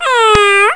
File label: MEOW